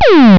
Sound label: peeeooop